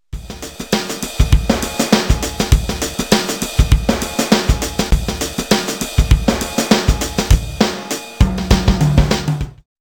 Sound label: Funky Drum